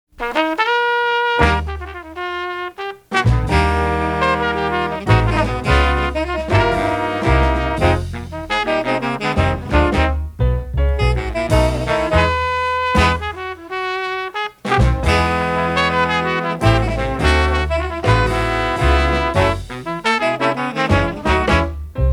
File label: jazz